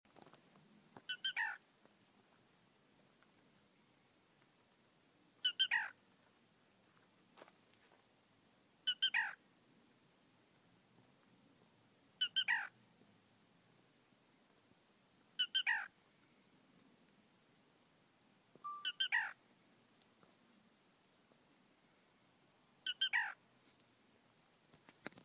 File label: Black Rail; WV